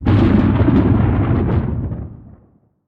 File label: thunder